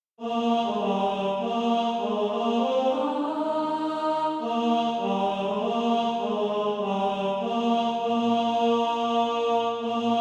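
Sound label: tenor, Practise Music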